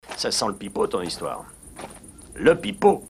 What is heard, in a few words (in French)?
pipeau; c’est du pipeau; Etherfields; Awaken Realms